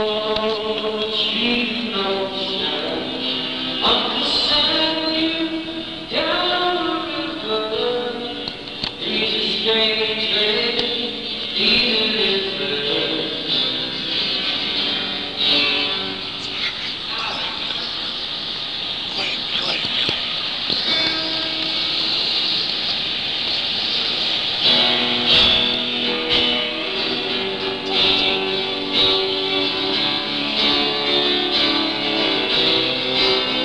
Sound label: three hits soundcheck; bates college - lewiston, maine